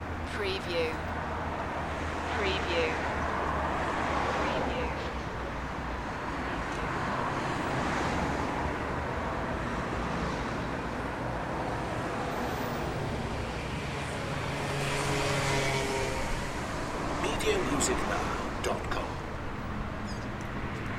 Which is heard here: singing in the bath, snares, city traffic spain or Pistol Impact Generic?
city traffic spain